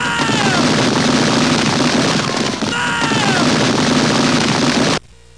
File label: Gun Battle; Revolutionary War